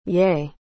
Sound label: Yay